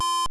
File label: timer score; Super Mario Land